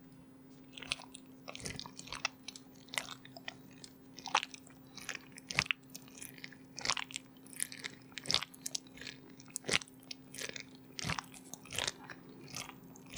クチュクチュ ゆっくり

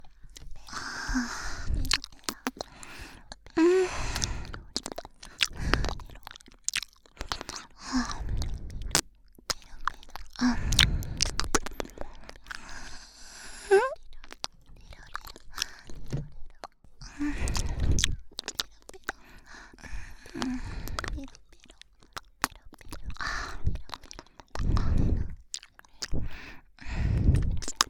オノマトペ入り右耳舐め

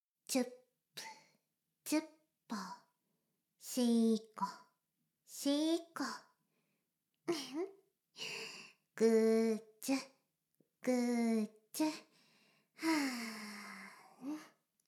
シコシコ音声; ASMR; 魔法少女マスカリマッスル

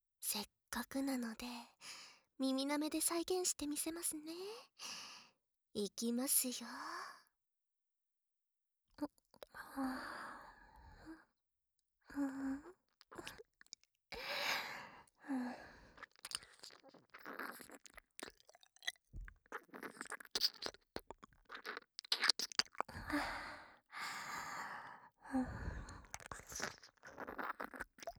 おまけ耳舐めパート, ASMR

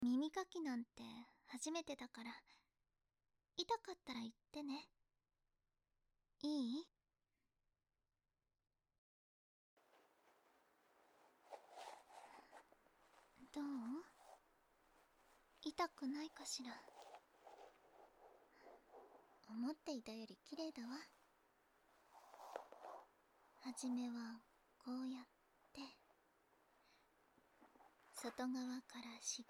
右耳かき, ASMR

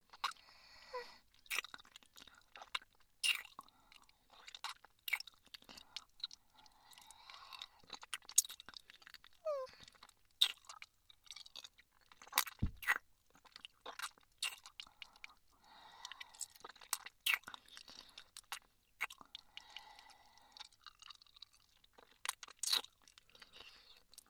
ディープキス音; ASMR

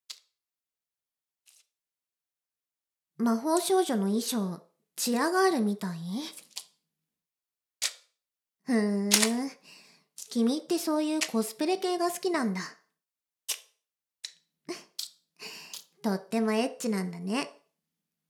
耳舐めなし; 魔法少女マスカリマッスル; 魔力注入で中出し密着海綿体トレーニング♪

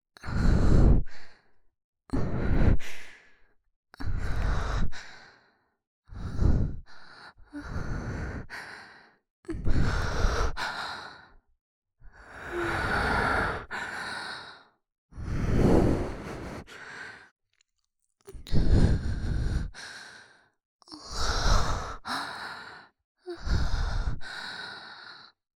吐息; 五藤主任の吐息

